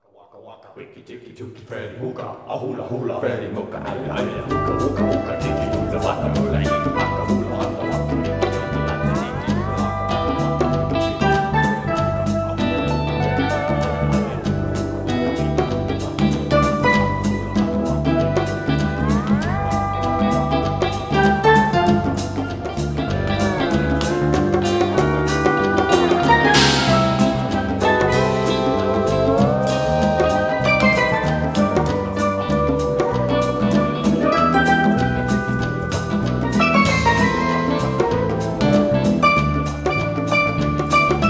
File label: Bongo; Bongo #2 (Alternate)